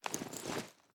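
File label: sniper reload empty fast mag grab; gmod; iw8; alpha50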